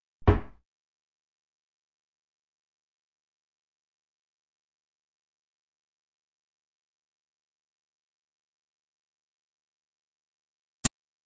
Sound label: Track, Doors; 헐리웃특수효과음; 문 소리